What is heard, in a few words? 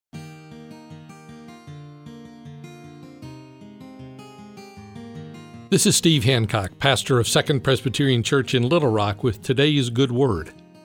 Laughter